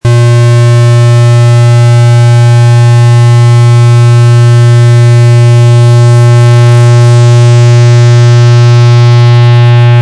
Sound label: PULSE, synth; simplesynth; simplesizer5